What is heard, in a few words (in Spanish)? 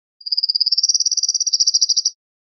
rep acanariado, pardillo